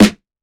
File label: snare genuine